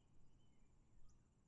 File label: day ambience